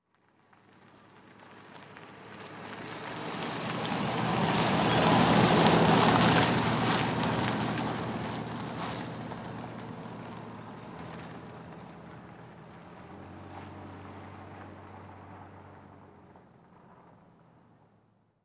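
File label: car passing slowly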